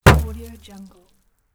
snowball impact automobile door

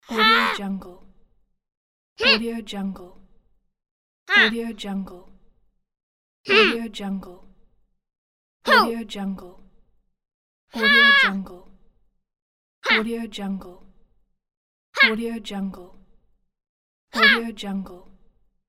little boy attack grunts

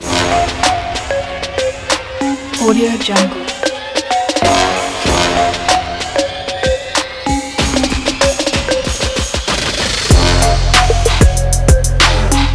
energy trap

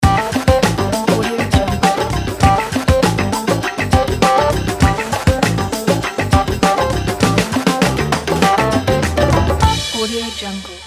vintage groove ident

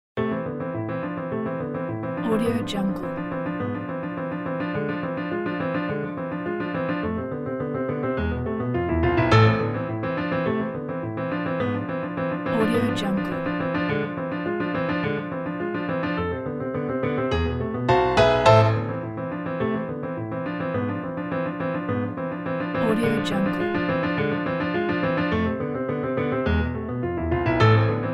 piano fugue

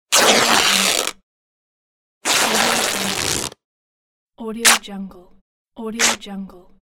rip up

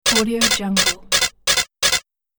droid future repeating move

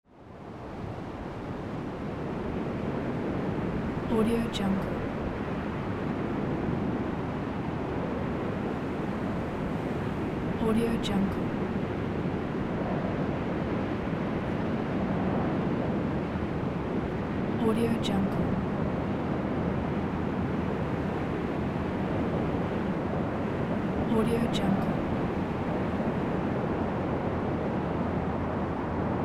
wind

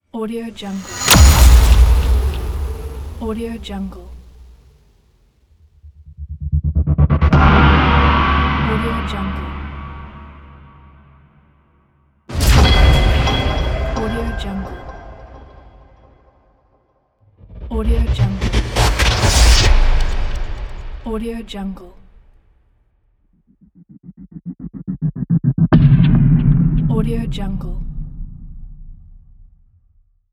powerful fx

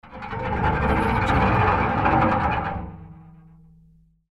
dragging fingernails against metal closet door

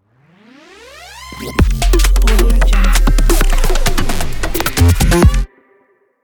intro glitch ident

